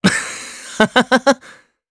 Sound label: Laugh, jp; Evan; kingsraid